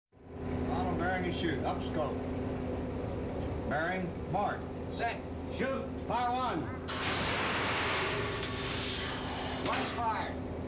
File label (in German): Marine Torpedoabschuss